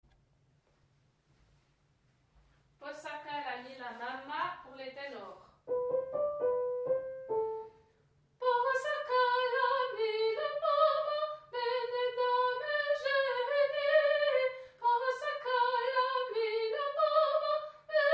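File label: posakala mila mama ténor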